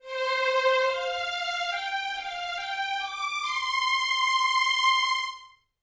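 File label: st violin tenuto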